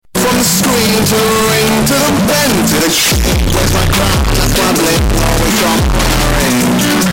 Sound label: bass